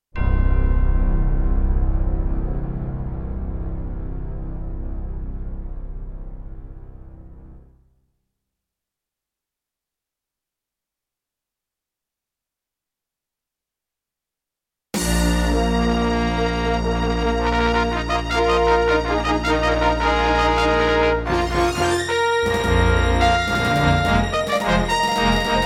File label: Intro, Roland SC-55mkII; Dark Forces